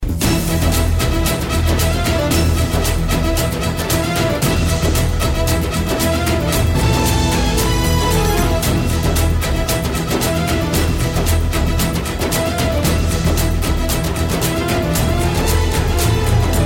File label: Music Beat